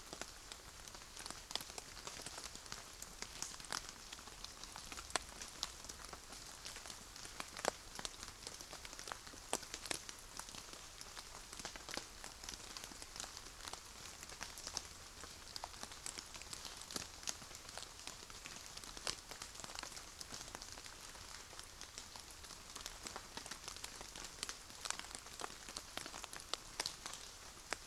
Rain light patters sparse aftermath, Thunder And Rain; boom library